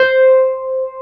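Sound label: Wurlitzer C5 - R; USB Soundscan vol.09; AKAI; Keyboards Old School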